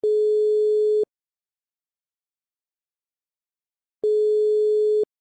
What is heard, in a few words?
Belgium ringback tone